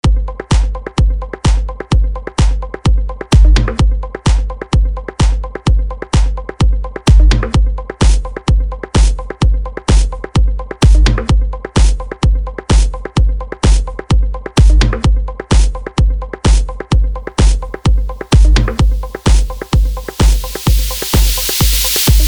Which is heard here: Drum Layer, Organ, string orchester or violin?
violin